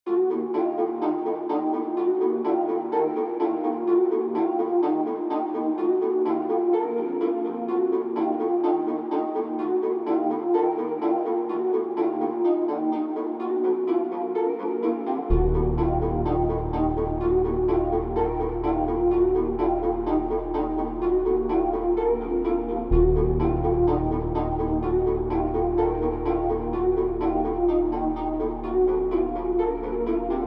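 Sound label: D minor 126 BPM Vox Guitar Piano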